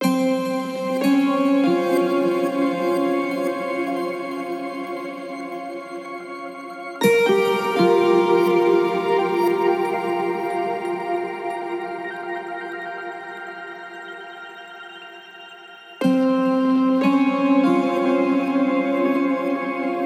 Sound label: Guitar - Abmaj 60BPM; STEMS; Ghosthack; SkyRealm; Mood Kits; Mood Kit 06 - Inner Reflection